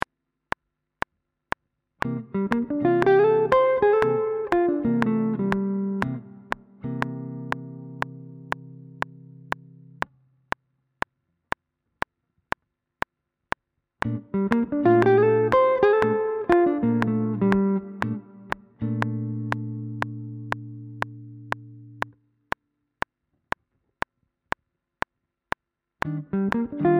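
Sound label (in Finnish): C7 Bbmaj7 Ebmaj7 Cm7 sointujen päälle soitettuna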